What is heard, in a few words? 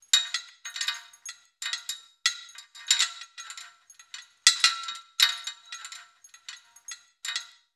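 metal rattle pole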